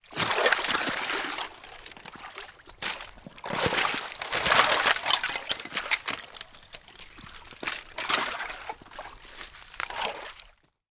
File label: foley footsteps movements in frozen deep puddle loose ice shards